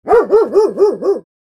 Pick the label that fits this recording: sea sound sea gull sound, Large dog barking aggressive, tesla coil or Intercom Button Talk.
Large dog barking aggressive